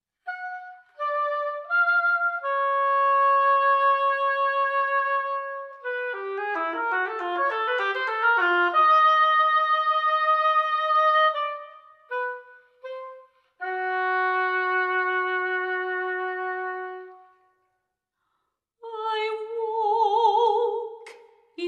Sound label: Rain